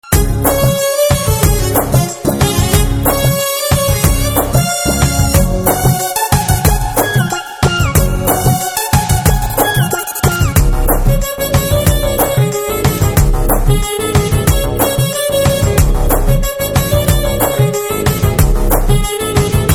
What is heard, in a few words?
Instrumental Tone